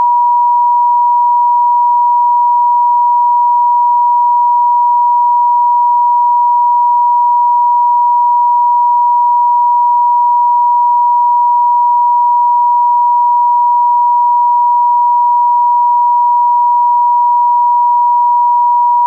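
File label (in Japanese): 963 Hz, ソルフェジオ周波数 信号音; 第7チャクラ 白; 高次元、宇宙意識とつながる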